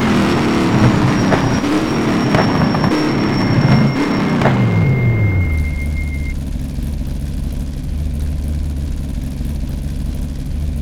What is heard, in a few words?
slowdown highspeed, vehicles cars; detomaso pantera; lwcars